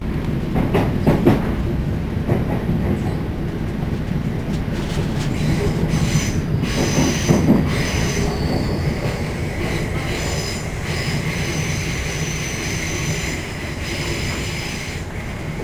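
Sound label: Environment interior train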